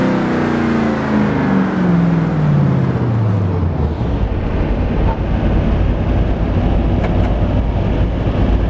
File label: throttle off; TDMCarpack; TDMCars